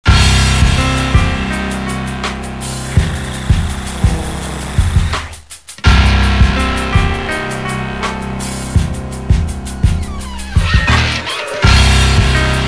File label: Suspense; Metropolis Crimes 2009 NDS gamerip